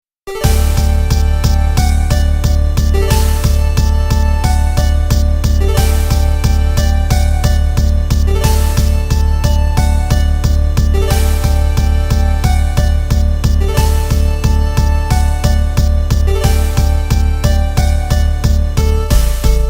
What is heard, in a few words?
8-bit; Dragon Slayer